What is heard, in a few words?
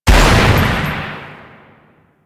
Shotgun Shot; Warframe; Veilbreaker; Kahl